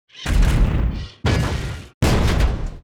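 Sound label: guard fighter death, monster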